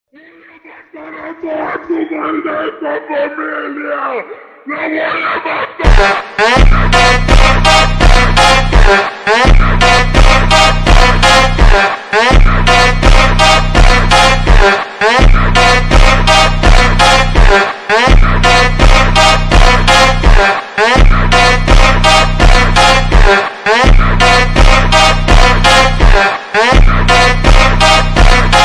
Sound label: funk slowed; tiktok version